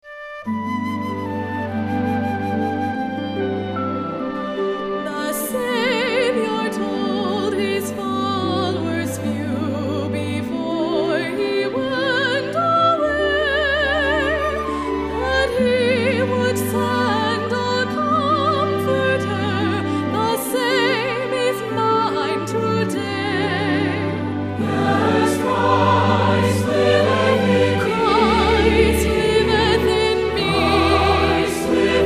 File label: Singers And Orchestra